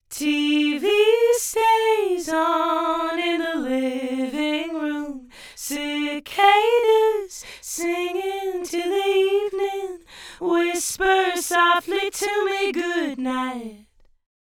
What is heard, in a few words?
vocal dual mono 50ms delay on right, Right side delayed by 50ms; Haas effect